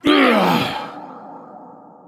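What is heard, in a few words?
non verbal ouch, voice; cernunnos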